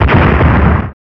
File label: Explosion; Revolution X; SNES